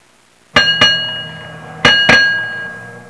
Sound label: Bell - Gas station; Olduvai Sounds